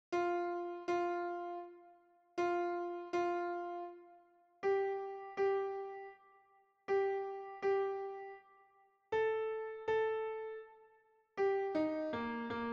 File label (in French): piano alto